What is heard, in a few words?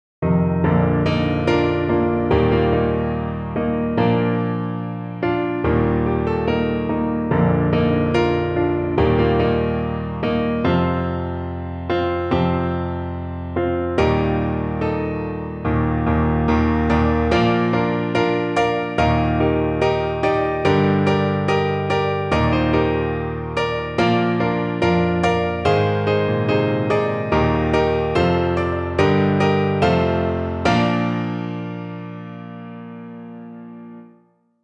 Piano